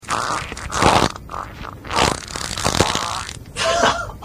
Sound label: wet one, farts